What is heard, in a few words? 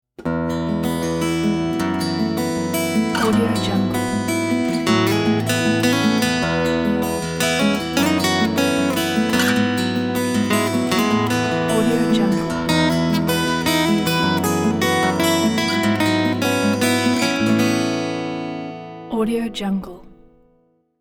acoustic health ident; qkmusic